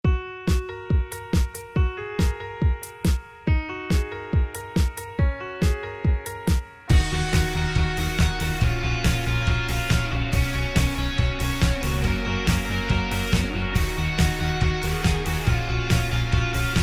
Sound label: Instrumental